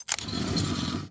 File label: door metal sliding open start; Rust